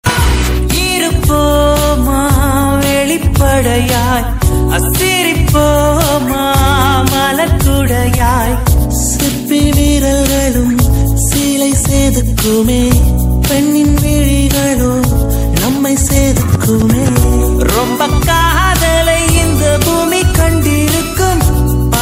tamil love whatsapp status

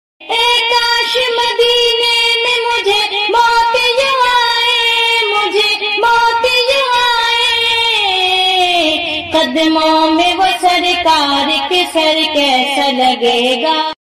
naat sharif

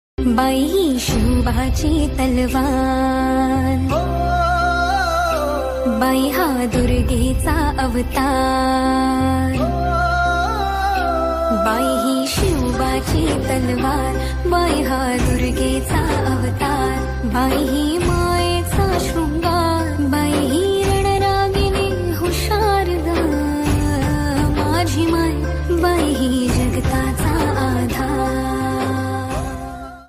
marathi; kojagiri purnima; hirkani; shivaji maharaj

